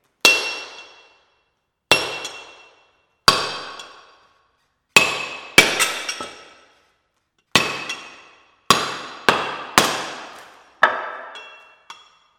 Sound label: Quarryman Tapping